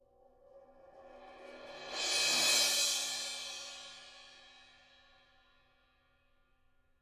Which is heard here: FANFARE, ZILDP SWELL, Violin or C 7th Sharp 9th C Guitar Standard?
ZILDP SWELL